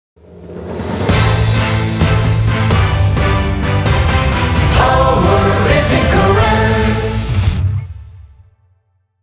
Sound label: Simrod Jingle Eng; Hoodej Studio